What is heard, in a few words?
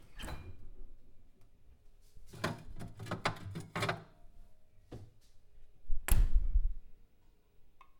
Opening Closing Fridge